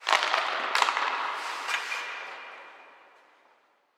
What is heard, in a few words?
Hockey Puck Hit; Blastwave FX